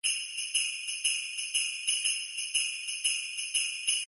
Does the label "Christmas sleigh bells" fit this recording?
Yes